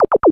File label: Magical Girl Magic Shot